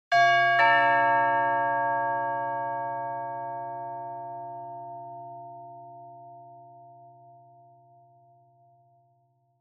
Doorbell Mech Tone Low Close

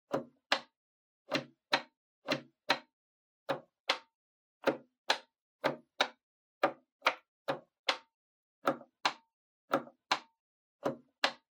Public Phone Button Pattern Gentle

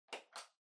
Calculator Far Battery Cover Open

